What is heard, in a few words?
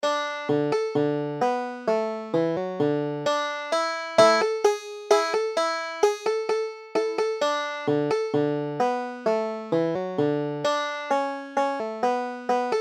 hornpipe, banjo; hostetlers